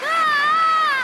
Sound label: scream jp; furina